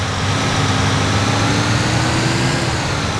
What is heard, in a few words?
third, vehicles; fireengine